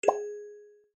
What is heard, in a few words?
plinko; casino